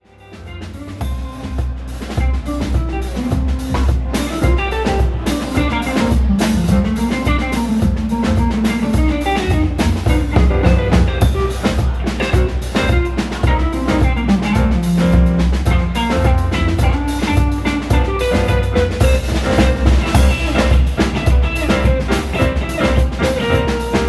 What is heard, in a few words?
Jingle Bells live